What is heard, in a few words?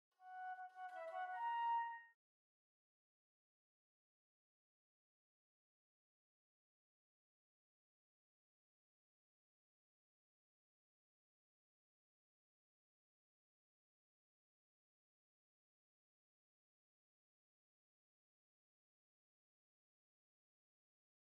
Flute